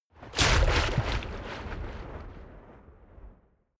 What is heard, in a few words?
waves splash hard